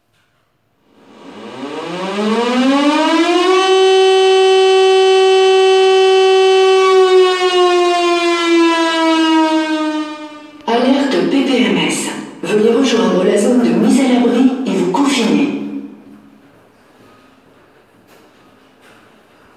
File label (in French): alerte ppms confinement